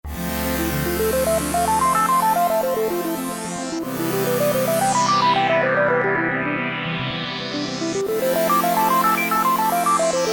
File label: Elliptical, Roland JP-8000; Factory Performances